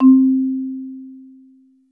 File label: c marimba